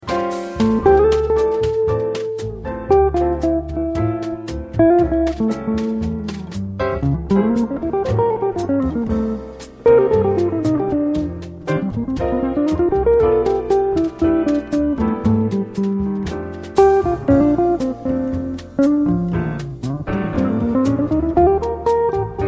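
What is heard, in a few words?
bossa solo, guitarist, jazz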